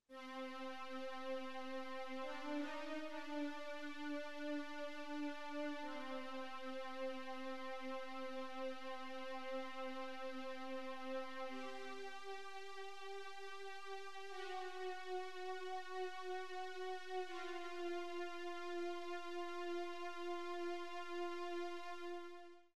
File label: String right; QFG1